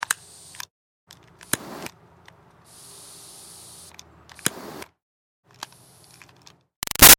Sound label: Lighter Butane Torch Small Short Spurts; Flames; Objects; Big Room Sound